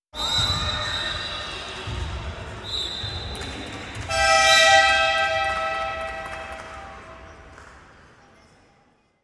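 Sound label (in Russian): звуки футбольного горна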